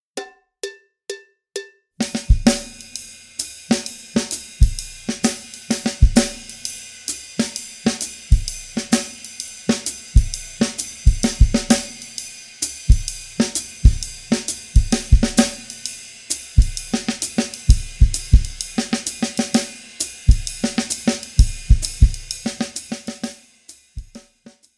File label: Comping, Jazz Latin; Schwarzes Album; Bum Bum Tschack